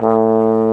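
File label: BRS Bass Trombone, Solo; Roland LCDP06 Brass Sections; Bs.Trombones; Bs.Bone Solo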